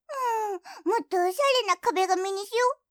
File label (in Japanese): Windows テーマの変更